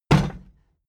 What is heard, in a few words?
plastic box big kick rattle; soundpool